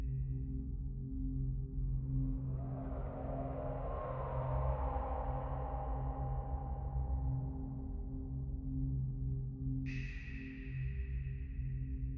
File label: Music Main Intro; godsoffortune